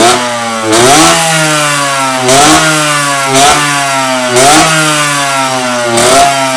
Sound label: tcr twin rev, pipe